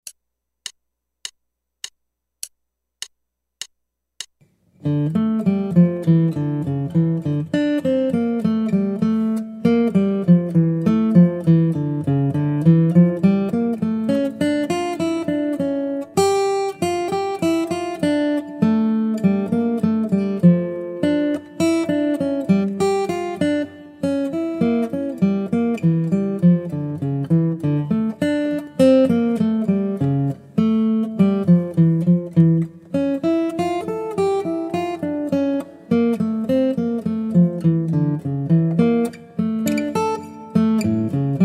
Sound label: Guitar